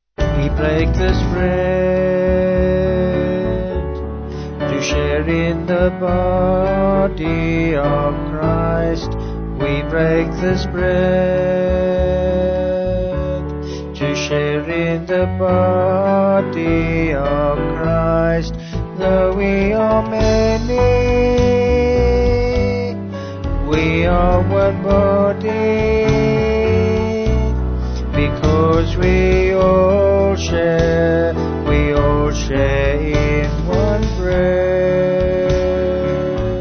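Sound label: Vocals Band, Music Backing Files for Churches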